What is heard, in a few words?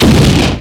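Death Exterior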